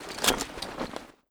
reload end; Rust

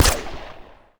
claw shoot

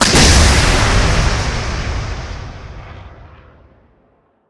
Missile Shoot; Weapons; DCS

